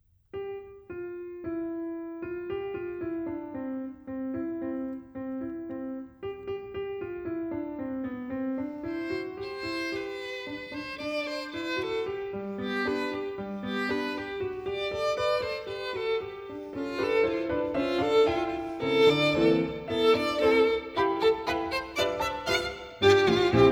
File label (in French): Fugue a tatons, pour Violon et Piano; Erik Satie; Choses vues a droite et a gauche (sans lunettes)